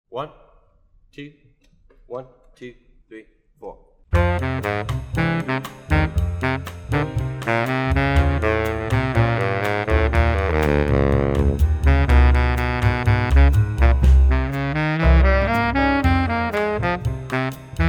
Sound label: latin baritone saxophone